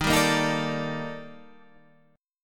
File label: D sharp Major Sharp 11th D sharp Guitar Standard, chord; strummed; 11,10,8,8,10,10; JGuitar